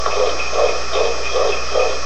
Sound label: baby reindeer